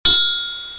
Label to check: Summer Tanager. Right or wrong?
Wrong